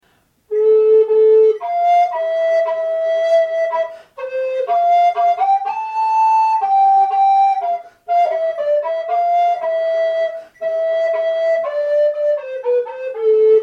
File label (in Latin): personent, FLUTE